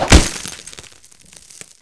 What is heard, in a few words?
knife hit wall; CPY JAIL; Fists